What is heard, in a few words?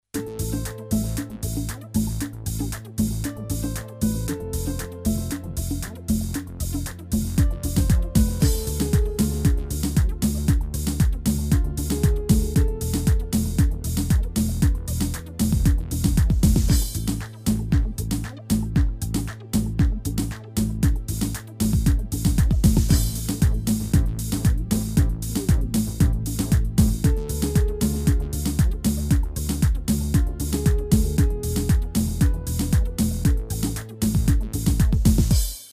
r b pop, yamaha psr a300tr v2 ritm